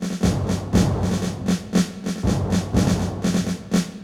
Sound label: MARCH, MUSICAL; 1000fx